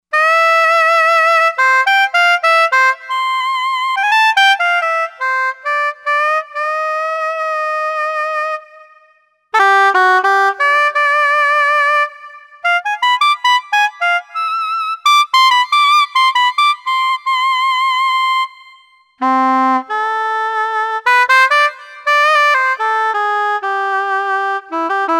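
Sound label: Reed Soprano Sax, synth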